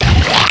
Monster Crunch; bars&pipes sound kit

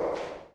foot crow; phoenix-end

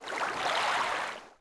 swim stroke; DAoC

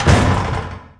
Rearm; Big bang

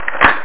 Krrrik

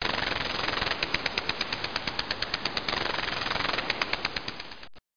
sprinkler

